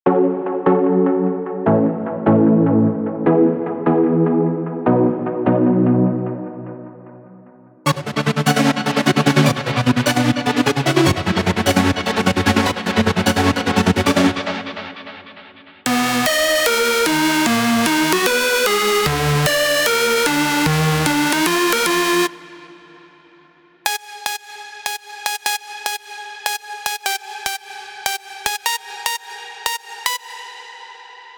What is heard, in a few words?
Hardstyle Hardcore